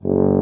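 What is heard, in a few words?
brass tuba; Miroslav Vitous; Solo Instruments VOL-2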